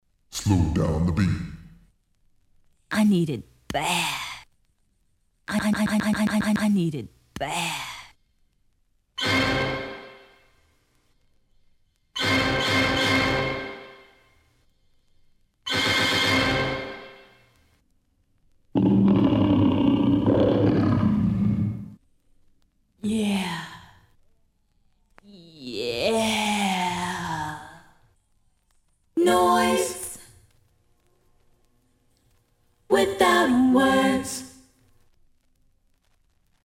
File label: scratch samples; ultimix vol 006